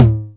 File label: 909 Lo Tom; 909 Extended